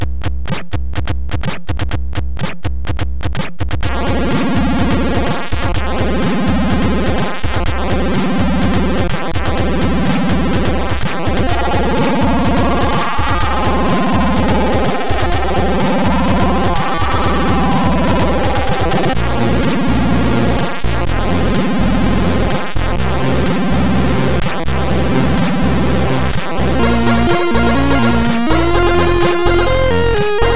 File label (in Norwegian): tre musikk; Music Assembler